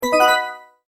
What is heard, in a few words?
multimedia tone advance; Sound Design; McKinney Sound